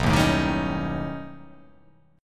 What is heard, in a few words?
B Augmented 9th B, chord